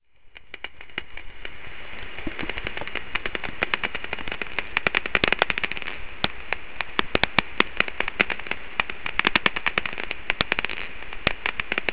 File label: Eptesicus nilssoni; lepakot